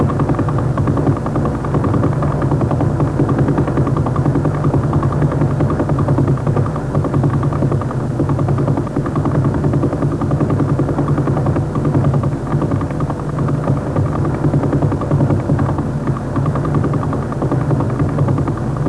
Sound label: pulse; Radio Science Observing Vol2